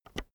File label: keyboard external enter push